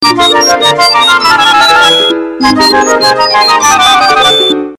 Spring Tones

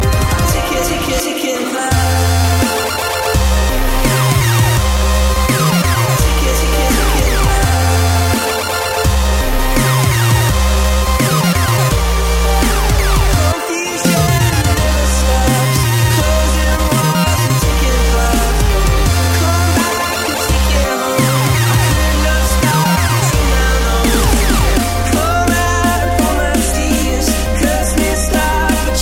Dubstep Alarm Clock

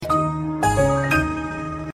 samsung tone; ringtones; sms

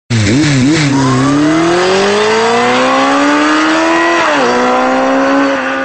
sports bike

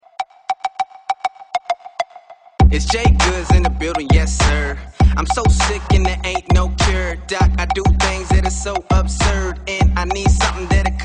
anthem

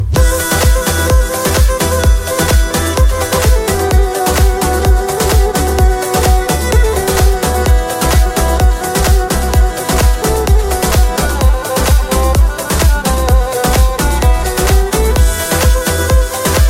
Beat

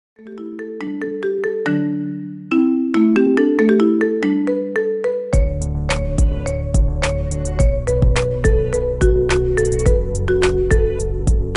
Is Calling Pop

